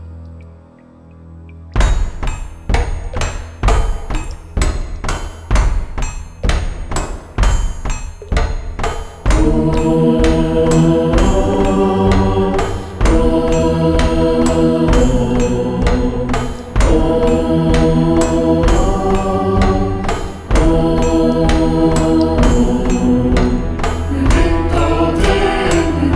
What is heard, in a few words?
Minion Chant; kingdom rock choir rehearsal tools